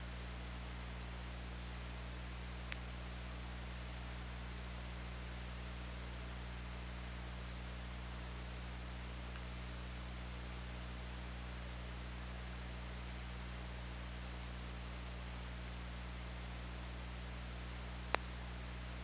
OrcaLab

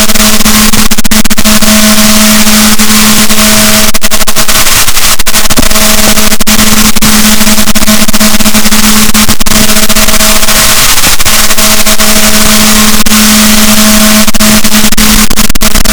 rorquals, Balaenoptera Physalus, boat

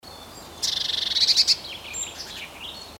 Cyanistes caeruleus; SETE

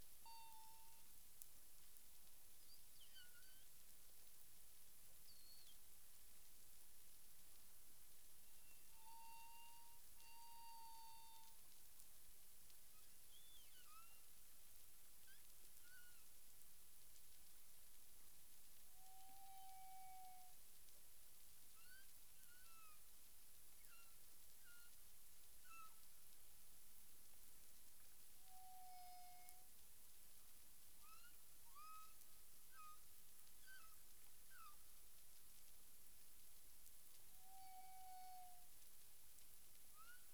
humpback whale; CARIMAM

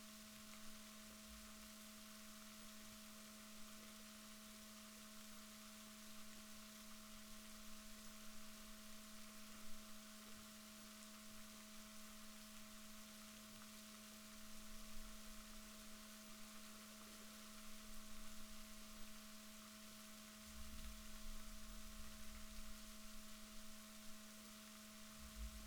rorquals positif, Balaenoptera Physalus Chile

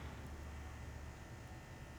pulses, BALAENOPTERA; MONACO 2022-07

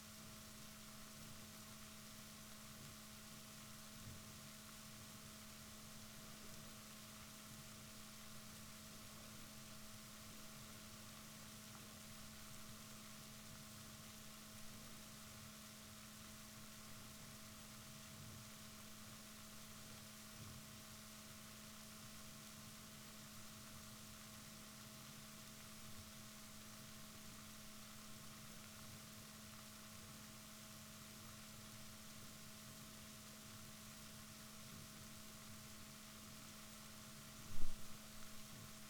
rorquals negatif, Balaenoptera Physalus Chile

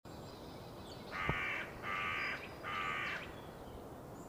Corvus corone; SETE

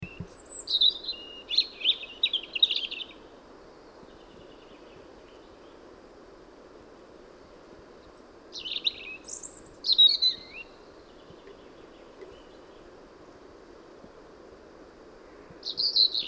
Erithacus rubecula; SETE

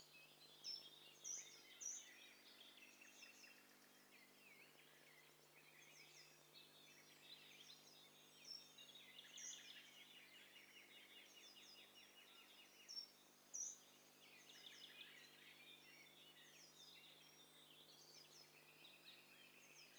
STEREO BIRD COCKTAIL PARTY

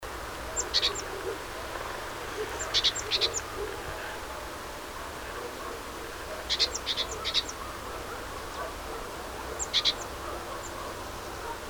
Parus major; SETE

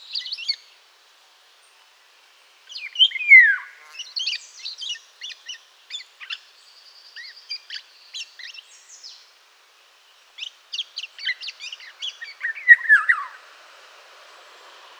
oriolus oriolus, bird

